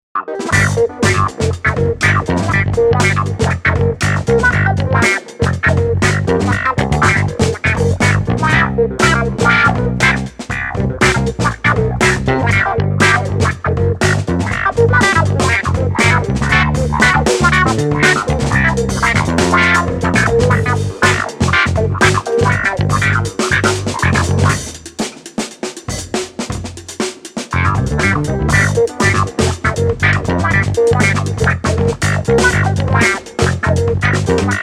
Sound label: oldschool